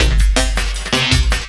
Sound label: LOOP, 160 BPM, Drum & Bass